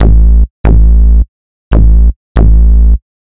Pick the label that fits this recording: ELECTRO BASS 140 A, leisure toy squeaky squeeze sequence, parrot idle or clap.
ELECTRO BASS 140 A